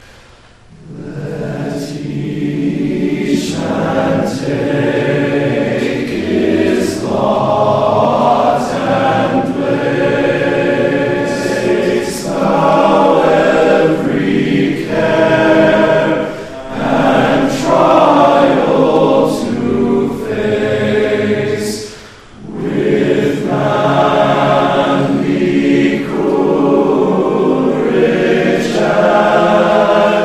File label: Sinfonia Hymn, dulcimer